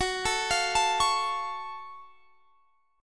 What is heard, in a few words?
F sharp Minor Major 7th, Guitar Standard; arpeggiated; x,21,19,22,22,21; down